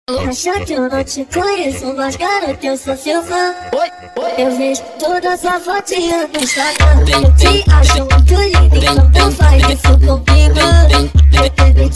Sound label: funk mega bass